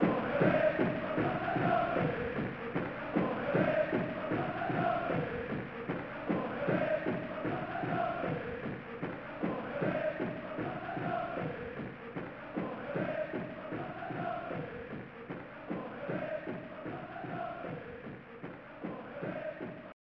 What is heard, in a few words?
Crowd Ambience; Total Football; SNES